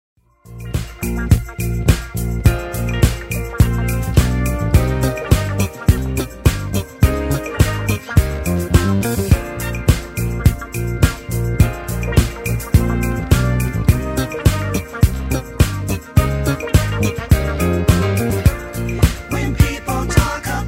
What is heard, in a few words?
Background